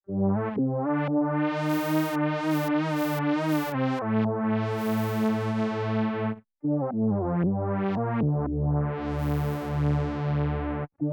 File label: Eventide H9 Dark Synth